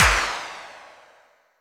high jump; HeavenStudio; night Walk Rvl

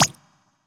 flip; HeavenStudio; flipperFlop

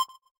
blip; HeavenStudio; rhythm Test GBA

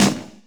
march Offbeat; HeavenStudio; lockstep

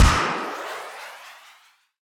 rocket cracker blast; HeavenStudio; launch Party

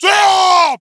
yell Raw; HeavenStudio; ringside

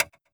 metronome right; HeavenStudio; mr Upbeat

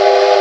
train; HeavenStudio; dogNinja

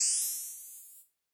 sweep; tambourine; turnPass

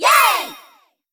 yay; HeavenStudio; cheer Readers

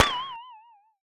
rocket end bad; HeavenStudio; launch Party

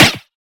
hit; HeavenStudio; sickBeats

